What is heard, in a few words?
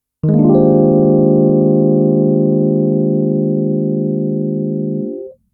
Maj6 Maj13add4